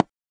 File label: beep